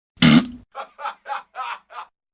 fart laugh; sword and sandals 2

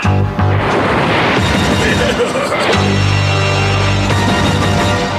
dun dun dun

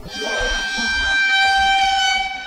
suspect violin

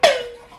pringle tin hit, pringles tin

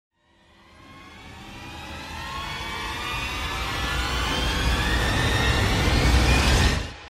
tension

